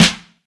snare f key, Royality free steel snare drum sound tuned to the F note. Loudest frequency: 2440Hz; drum single hits one shots; snare drum samples